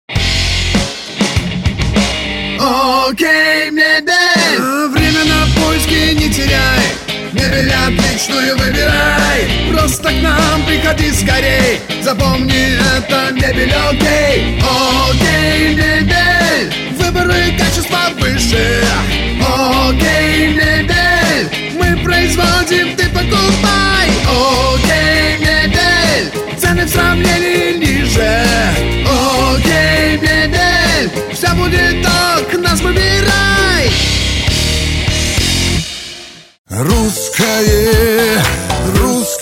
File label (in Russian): vokal